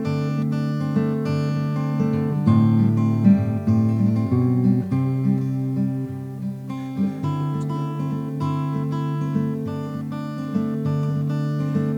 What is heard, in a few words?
Ac Gtr Boom No Auto EQ